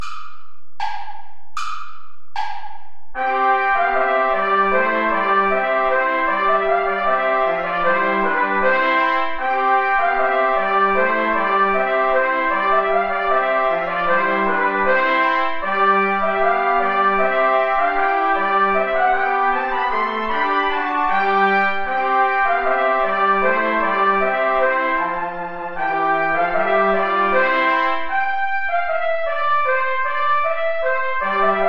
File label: deck the halls play along without trumpet; traditional